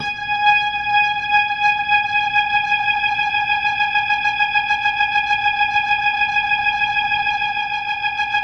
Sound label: Hammond G#; 3D Sound - 3D Keys VOL-1